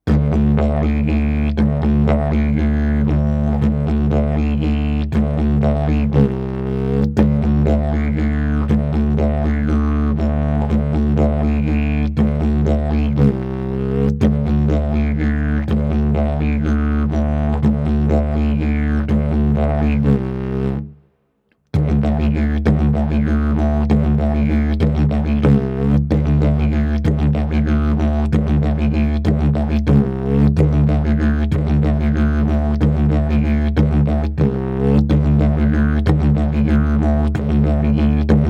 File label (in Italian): ritmo livello intermedio